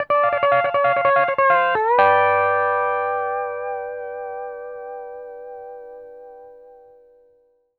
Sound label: PD LOOPS 140, Whole Lotta Country